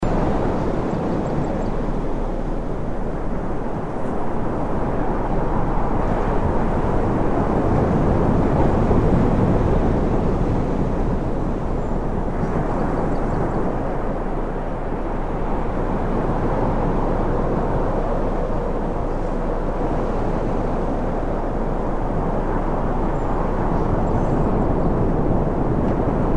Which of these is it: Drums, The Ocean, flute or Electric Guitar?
The Ocean